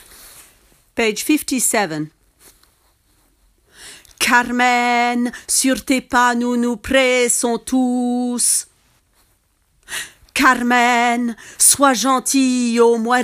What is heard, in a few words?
tenor; Carmen